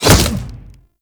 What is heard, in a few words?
Punch C; Warframe; Kuva Lich